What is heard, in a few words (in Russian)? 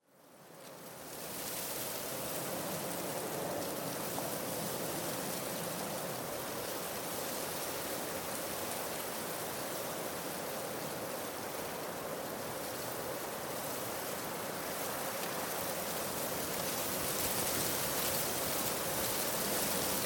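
звук легкого осеннего ветра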